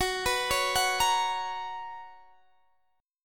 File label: B Major 7th Suspended 2nd, chord